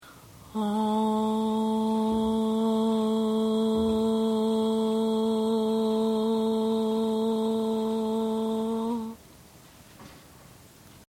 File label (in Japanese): 裏声サンプル